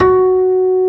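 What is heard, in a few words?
harm F#4, piano; AKAI S-Series CD-ROM Sound Library VOL-2; PF MIX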